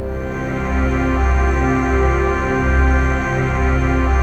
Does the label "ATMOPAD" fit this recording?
Yes